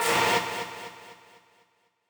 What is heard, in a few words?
stab